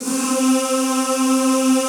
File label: ZZZ C3, Classical Choir; Best Service; Extended Classical Choir